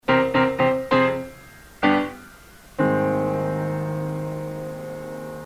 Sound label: Deceptive Cadence